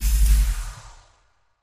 SPACE LOCK DOOR OPEN, pneumatic

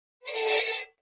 Metal Scrape On Metal

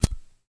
Zippo Lighter Flint; lighters & matches

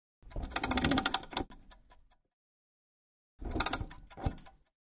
Clock Winder

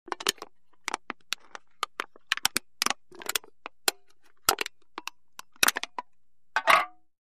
Aluminum Can Crushed By Hand And Set Down

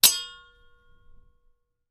Sword Clangs Sword, swords

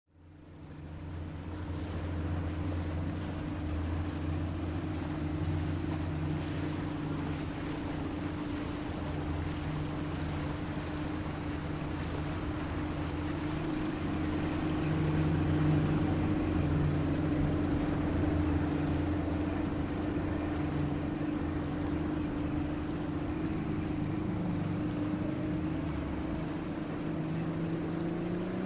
Ranger Boat On Board

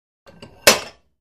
Free Weights Exerci

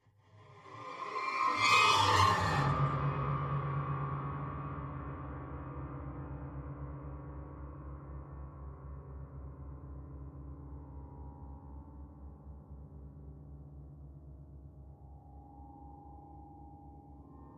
Horror Grand Piano Stri, eerie ambience

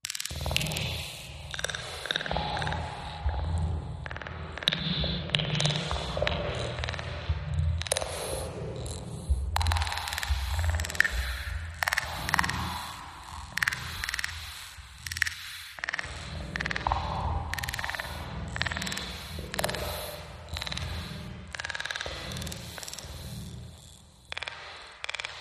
Random Blips, Machine, data processing

